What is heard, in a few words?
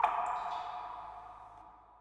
amb waterdrips